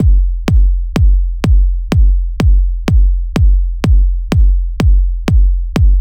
Kick ramené au même niveau subjectif que le Pad

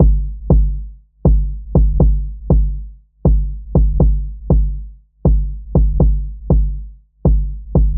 Kick filtré au dessus de 1200Hz

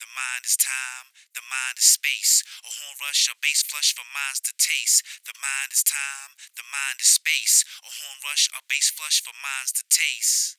Voix HPF 2 kHz

